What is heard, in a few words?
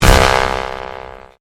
Convolution Pulse; Sound elements to create more complex sound effects; Swell Sound Effects; Swells (Sound Effects:Sound Design)